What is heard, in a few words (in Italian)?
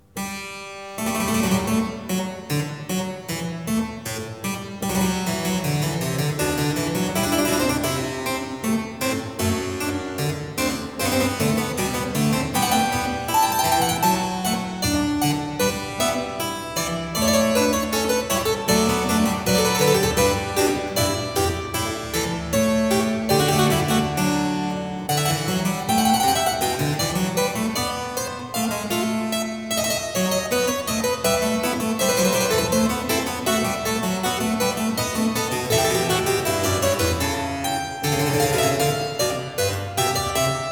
Variatio 10 a 1 Clav. Fughetta; BACH; GOLDBERG VARIATIONS